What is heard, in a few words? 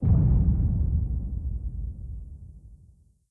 Boom; blue archive